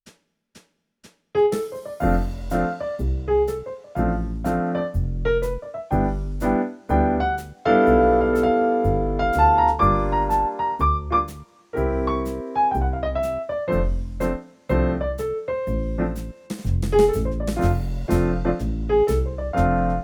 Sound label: yamaha csp-170 style trad piano jazz